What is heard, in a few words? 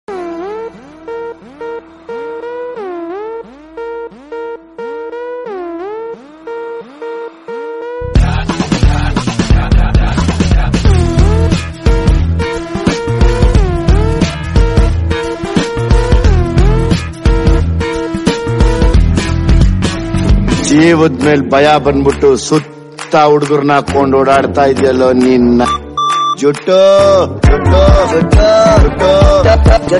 elephant bgm; salaga